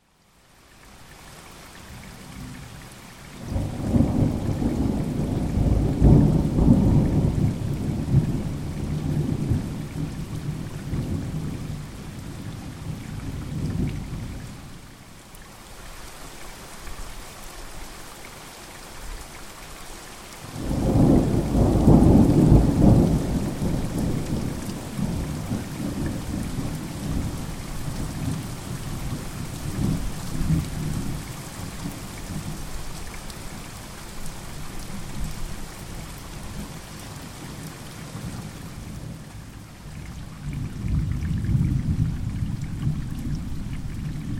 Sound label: distant thunder